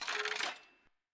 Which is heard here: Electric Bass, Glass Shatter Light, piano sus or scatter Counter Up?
scatter Counter Up